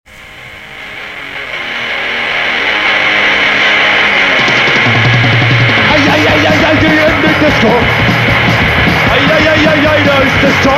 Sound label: Disco